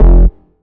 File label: Bass F, Bass Synth